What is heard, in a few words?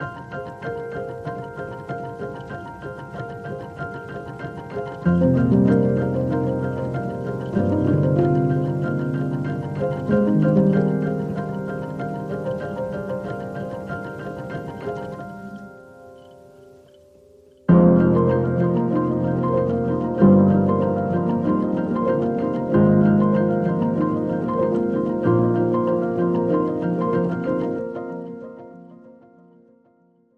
Piano Only